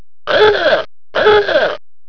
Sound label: AHOOGA